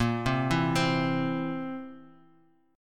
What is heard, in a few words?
A sharp Suspended 2nd Flat 5th A sharp down